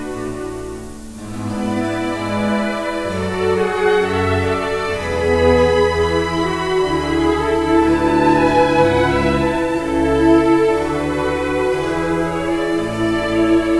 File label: air 4 string